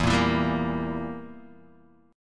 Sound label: E Diminished E, Guitar Half Step Down